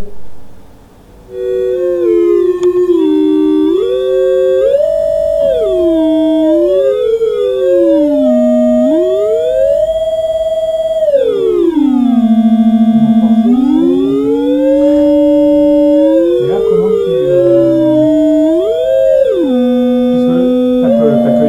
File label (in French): son deux frequences